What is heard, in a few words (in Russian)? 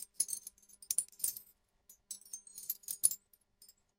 mnogo brasletov perezvon; ukrasheniya